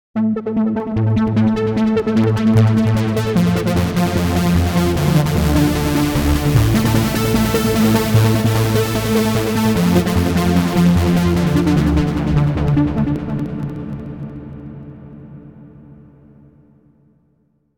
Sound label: Quartex Brass, Korg